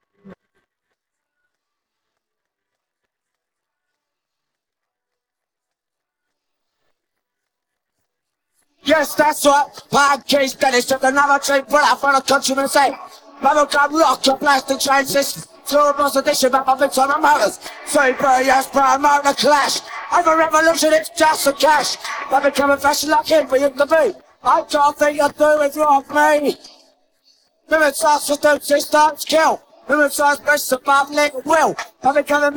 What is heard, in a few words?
vocals